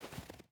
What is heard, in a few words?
footstep hide snow walk; Rust